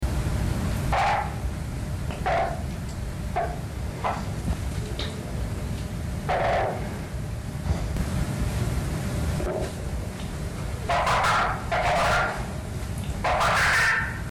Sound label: stresscall